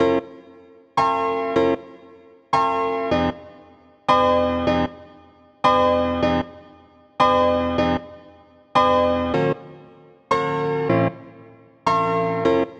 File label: piano; Pony Fan Music Remix Stems